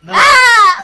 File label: AHHH!!